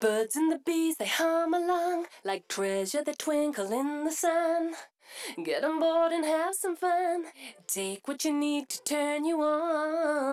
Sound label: Vocal